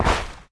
footstep; smite; apollo v4 skin14

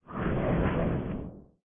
float; smite; thanatos

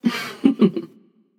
heh heh passion; chaac

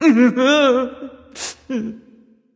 boohoo; smite; kukulkan

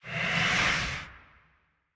ghost run; smite; zhongkui

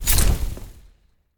pwr swing; serqet; smite

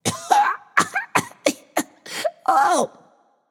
clear throat, voicepack; smite; jorm

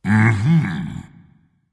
agree dragon; fafnir

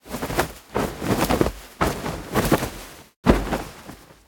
cog landing; smite; scylla

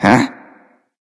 confused; smite; hachiman

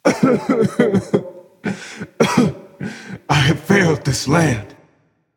boo hoo; smite; olorun

